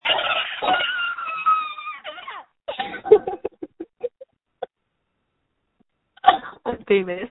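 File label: screams that feature laughter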